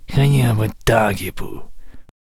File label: Deadeye Attack Vocals